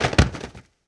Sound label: Body falls a wood surface